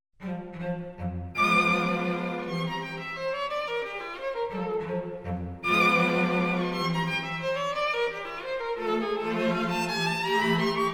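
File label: string quintet; dobrzynski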